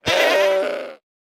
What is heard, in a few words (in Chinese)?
山羊死亡声